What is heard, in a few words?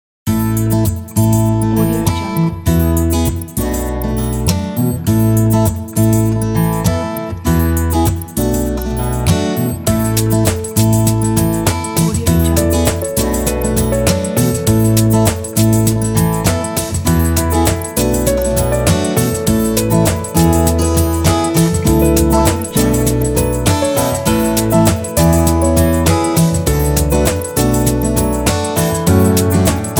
feel good summer